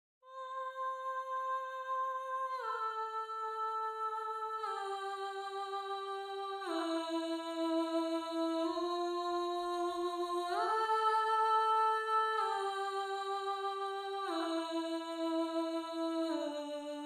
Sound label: Alto, counterpoint